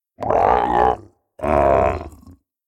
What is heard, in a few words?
Procoptodon sound